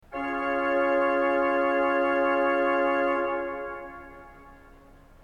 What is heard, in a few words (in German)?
ton, orgel